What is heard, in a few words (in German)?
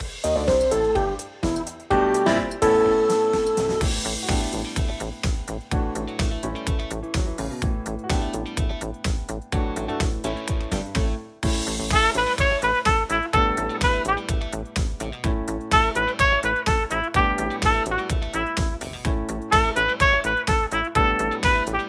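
Disco, Blechbläser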